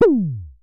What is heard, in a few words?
Synare Filter; Star Instruments Synare 3